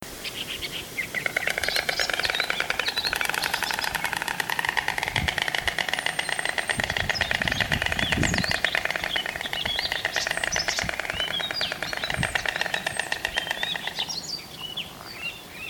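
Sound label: white stork voice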